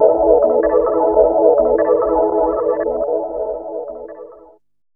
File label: DR. LOOP, TRANCE; X-Static Goldmine 3